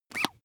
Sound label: bath duck release